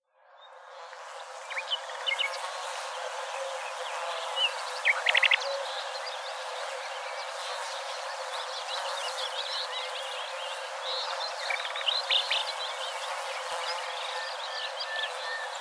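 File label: in spring in the meadow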